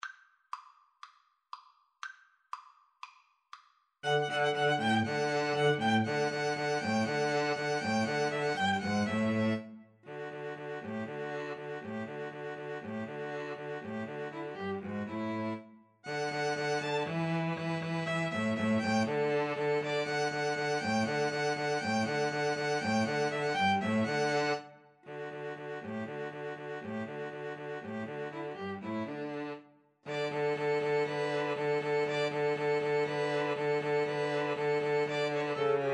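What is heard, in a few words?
autumn 1st movement, 2 Violins Cello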